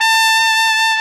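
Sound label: SOLI Trumpet, Alpine Volksmusik, TROMPETEN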